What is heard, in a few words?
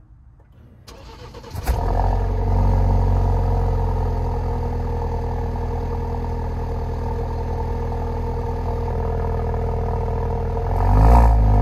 alfa romeo giulia